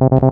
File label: dance music bass